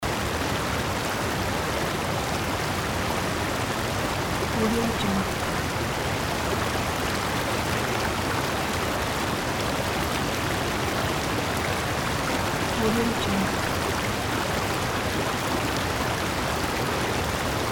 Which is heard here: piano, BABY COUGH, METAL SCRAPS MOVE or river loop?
river loop